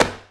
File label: Book Drop Wood; SFX Kit; Foley - Misc